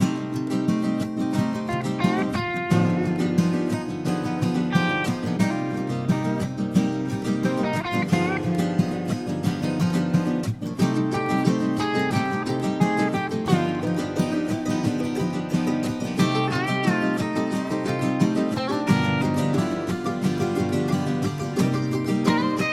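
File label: Smooth flowing guitar music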